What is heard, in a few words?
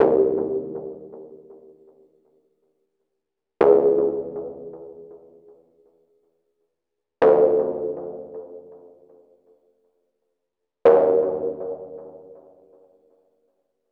Mono DBeat; KG MegaSaur